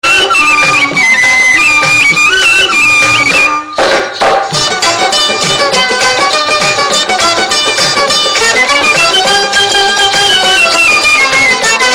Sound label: Bhojpuri Old Music